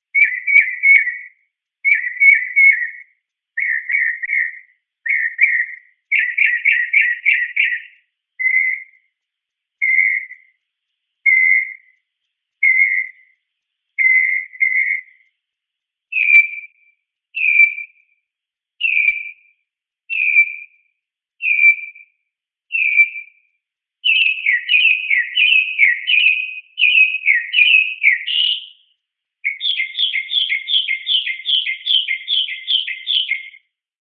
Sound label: Mocking Bird Multi, Multiple calls from a mockingbird; Animals; Birds